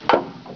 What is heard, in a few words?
switch, buttons; svencoop; quake1